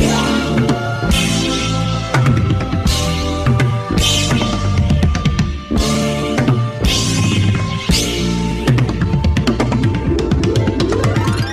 keys, Phonky; loops

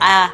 "ah"

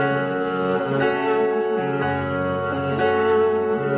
piano and strings, sad loops

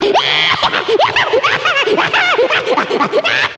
laugh cartoon; ooh ahh

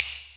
snapper, Clap n Snap, Snares

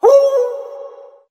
"hoo", voice; ooh ahh

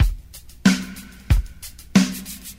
shakers, Boombap Drum loops

